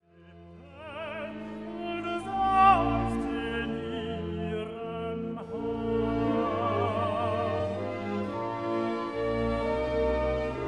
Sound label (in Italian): Recitativo ed Aria; Acis